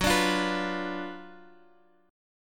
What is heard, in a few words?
F sharp Major 7th Suspended 4th Sharp 5th F sharp, Guitar Open D; 16,17,15,17,x,x